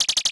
standard shot short x4 loop

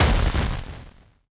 explosion

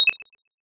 button beep piro

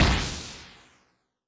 jet pass lowbit short

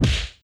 tsukkomi punch

